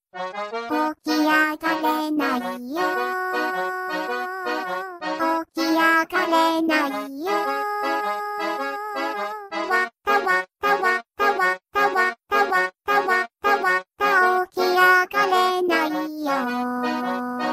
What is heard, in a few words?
Adorable Tone